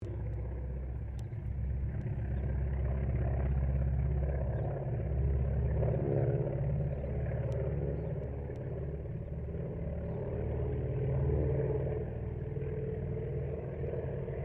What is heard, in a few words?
AIRCRAFT CERES WINJEEL WIRRAWAY PASS DISTANT; Mixed aircraft squadrons; Airplanes